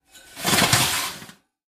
Oven Rack Pull Out; appliances

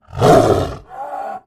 Tiger Growls Single; big cats

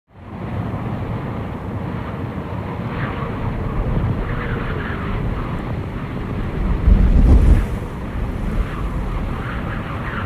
Very Heavy Gusty Wind, whistling

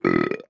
Belches Quick Low Ton; belching

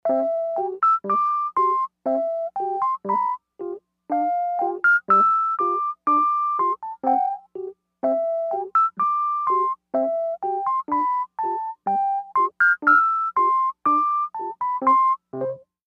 Toy Piano Play

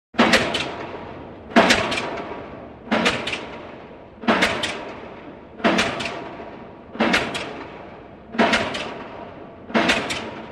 Pile Driver 1; Rhythmic Impacts Medium; Perspective With Slap back Echo Off Of Buildings.

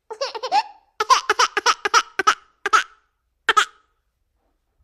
Baby: 10-month-old, Crying. Interior.; Mono

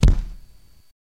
Cabbage Hits Table

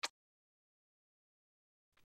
Kisses; Quick Peck With Light Lip Smack

